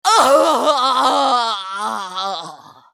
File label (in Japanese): スカウト男 死亡